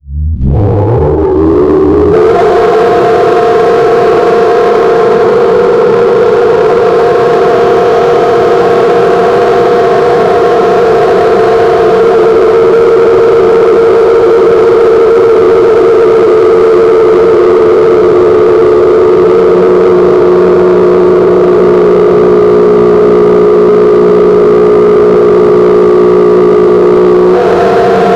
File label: an accurate tyrannosaurus